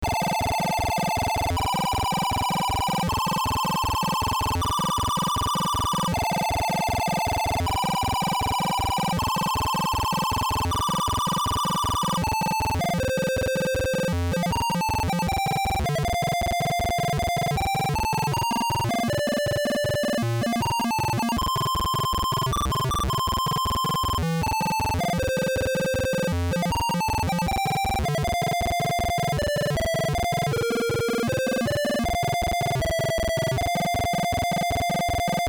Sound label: bgm